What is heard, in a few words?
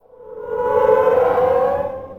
fiend; kult